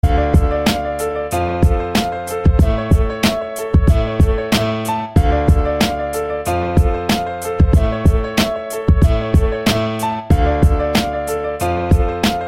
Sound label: Hip Hop; Gravity Falls